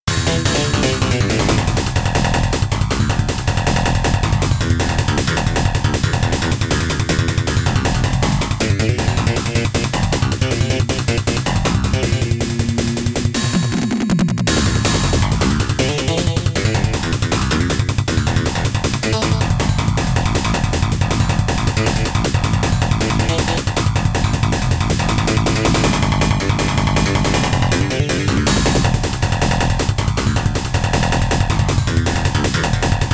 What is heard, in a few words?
Bass!; Strawberry Daisenryaku; x68000